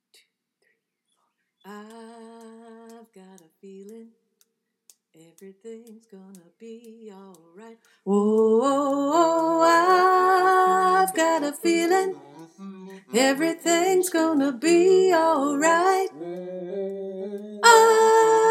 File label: Alto